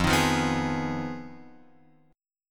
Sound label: F Minor 7th Flat 5th, Guitar, Open F; strummed; JGuitar